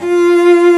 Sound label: Solo Cello F4; Strings